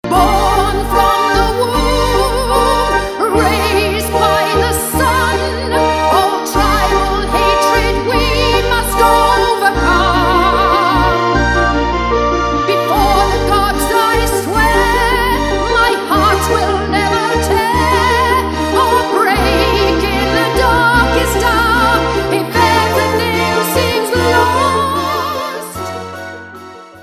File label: Celtic Battle Hymn, solo; Boudicca